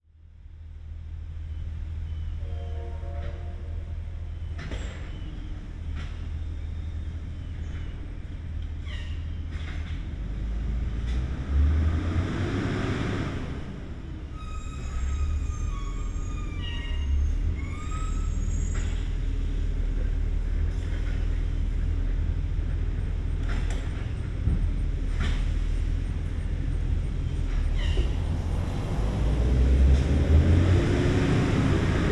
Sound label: squeaky bus